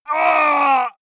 male painful cry

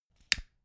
stick lighter light up flame

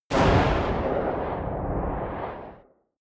missile large fire helicopter distant

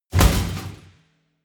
metal crash small

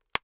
plastic slowly highly pressured

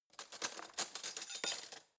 cutlery spoon drawer handle long

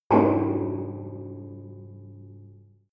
metal impact tank inside

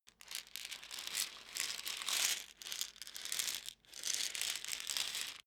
pillbox shake

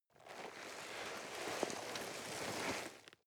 nylon leather soft rustling

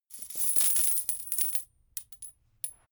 coins drop hard drip

